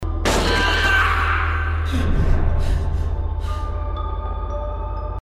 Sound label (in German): schrei; Never Ending Nightmares